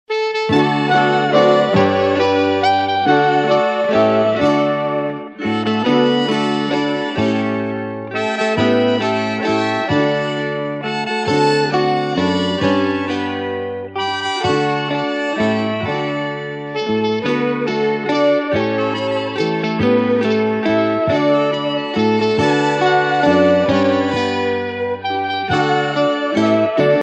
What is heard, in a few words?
happy birthday to you minus